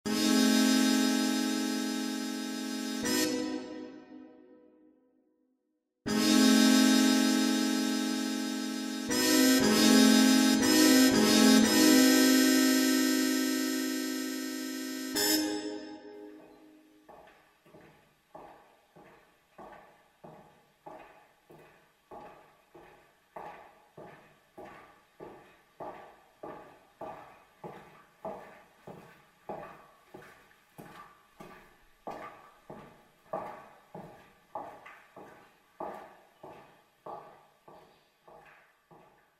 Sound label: chords plus footsteps